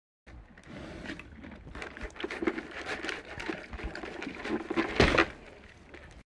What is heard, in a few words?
Animal effects snorts grunts farm ambience